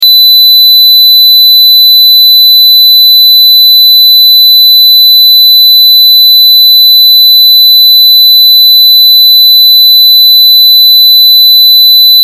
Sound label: Test Tone Square; Wave 4Khz 60 Secs 0Db Loop